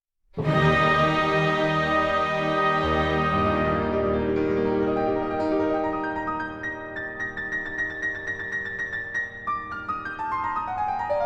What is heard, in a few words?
Piano Concerto No. 5 in E-Flat Major; Op. 73; Beethoven